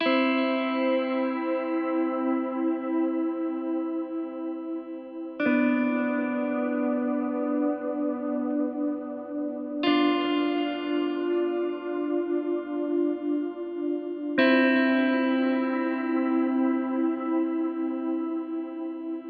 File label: guitar; Void Bastards; Krell